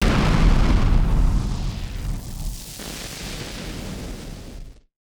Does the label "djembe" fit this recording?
No